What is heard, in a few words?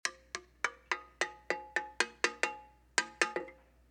PERC LOOP; Crowdsourced Sample Pack; TRUTH-HZ; Drum Kit; Percussion